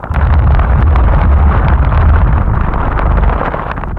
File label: Rock Fall